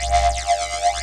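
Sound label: Ambience Sci-fi Energy Loop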